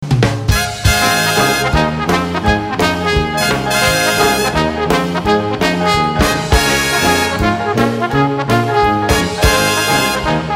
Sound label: mood, brass